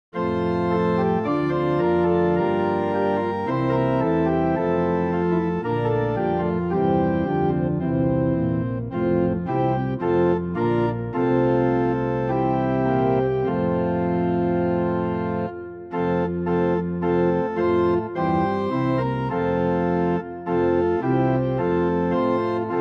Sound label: Pitch 440 Temperament Equal, Amen; hymn